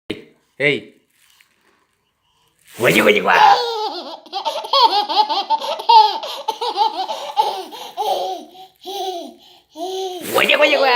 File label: sound baby laughter